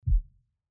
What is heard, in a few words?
hover off